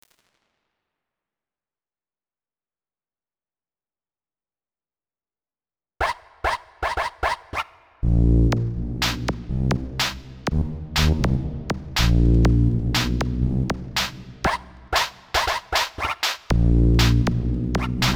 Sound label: MIDI ditty